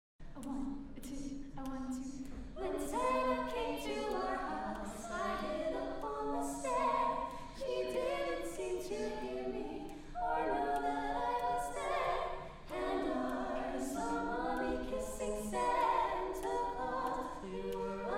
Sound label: HIGH SCHOOL CHOIRS Holiday Concert; SENECA VALLEY